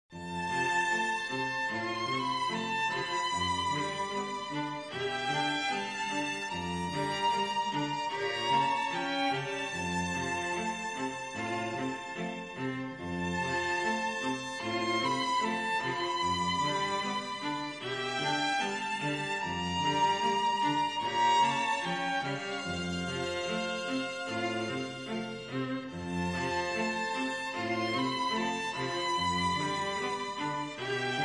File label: Long Way Home (Strings Version)